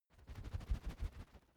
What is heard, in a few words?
feather flapping quick; kreaturen